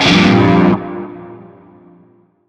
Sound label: Shawzin Phrygian Chord; Warframe; Narmer Shawzin